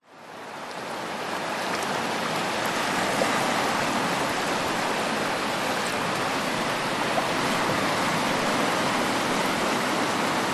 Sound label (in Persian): sedaye darya